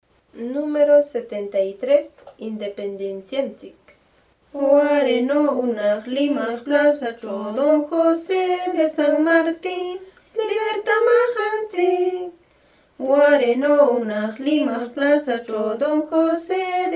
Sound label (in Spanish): cancion